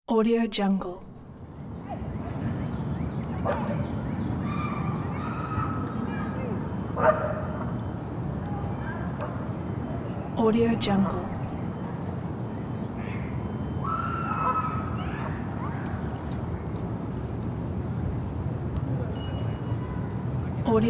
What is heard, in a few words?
city park ambience